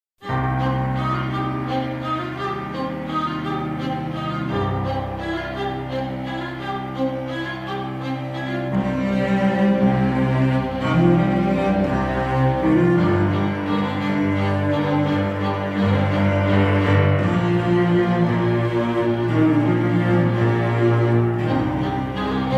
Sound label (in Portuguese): cordas teclado